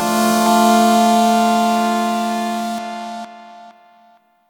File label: Synth Shots; Vengeance Samples